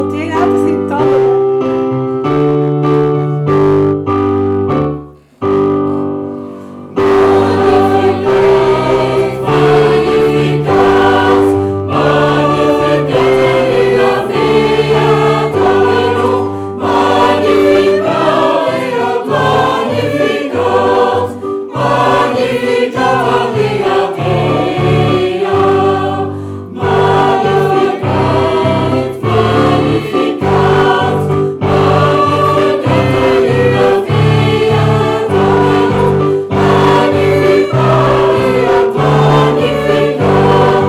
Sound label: SATB